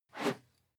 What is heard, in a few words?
whoosh whistle fast; swooshes-swishes